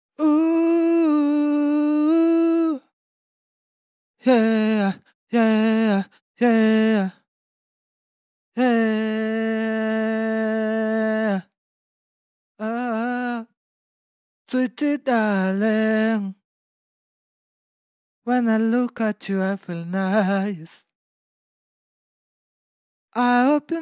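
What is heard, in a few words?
RnB Pop 111 D major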